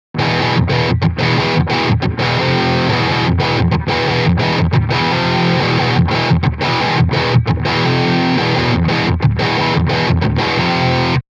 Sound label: single dist